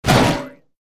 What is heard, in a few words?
KART Hitting Wall; Poodletooth-iLand